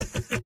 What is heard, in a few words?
laugh short; sniper; video games; source engine; tf; mvm